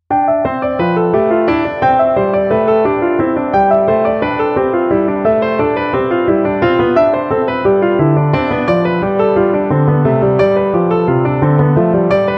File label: piano version; RPM challenge